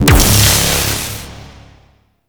Ground Laser Fire; Enemies; Abilities; Warframe; Teralyst; Bigalyst

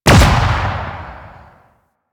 Note Projectile Hit; Warframe; Bard Quest; Bard Boss Fight

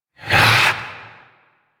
Possessed Vocal Attack; Enemies; Warframe; Priest Quest; Possessed Red Veil

